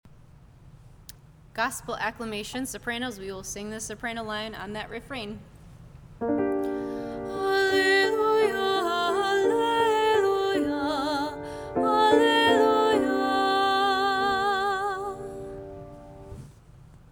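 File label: Easter soprano; Gosp Accl Easter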